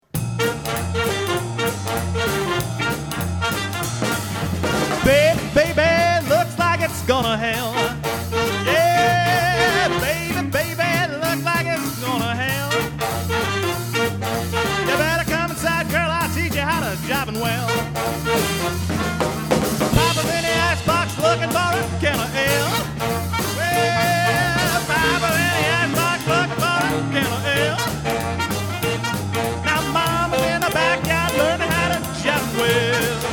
big band

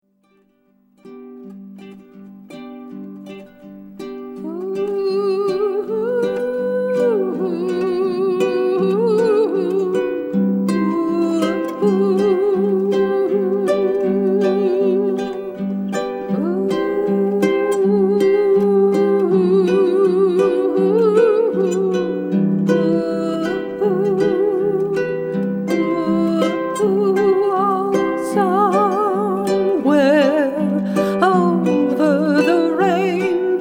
Vocal Celtic harp

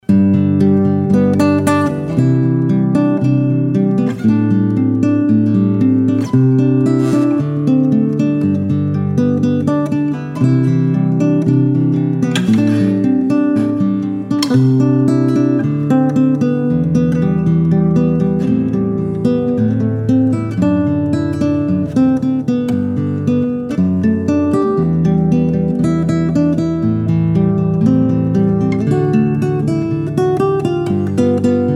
classical guitar

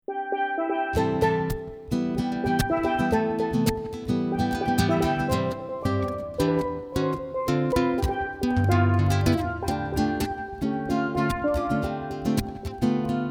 smooth jazz duo, Steel Drum

